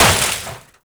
radio tower speaker damage; Infested

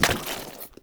Monster Pickup Impact; Warframe; Infested

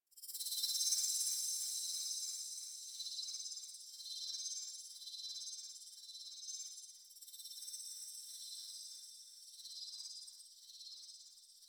SFX Touch Pulse; Warframe; Orbiter Nursery

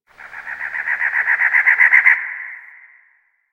Cactus Wren Bird; Warframe; The New War; Zariman; Apartment

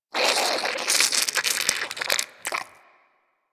Infested Goop Squeeze Close; Ambience; Warframe; Infested Eris

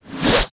Boomerang Fold; Warframe; Weapons

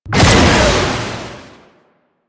Jet Turbine Pistol Secondary Shot; Warframe; Tenno

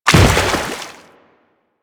Step Water, Footsteps; Warframe; Grineer

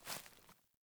Boot Walk Veg, Footsteps; Characters; Enemy; Melee Grunt; Boots; Sinecure

